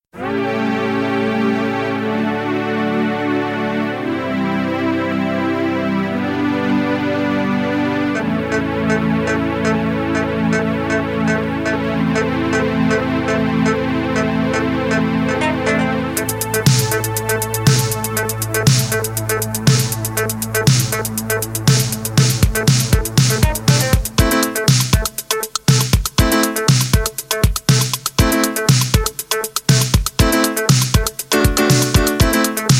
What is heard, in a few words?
Groove Suave; D2 Madrid